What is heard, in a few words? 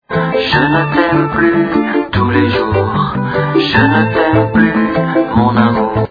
bongobong